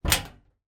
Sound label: household door metal meter cupboard external open; zapsplat